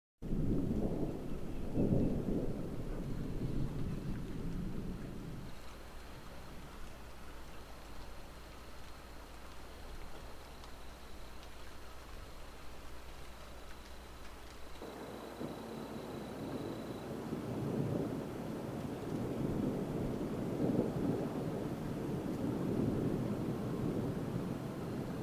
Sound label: Rain and Thunder